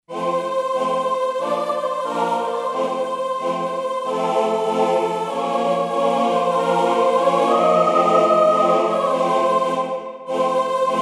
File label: DUAL CHOIR, Roland SC-880; Factory Patches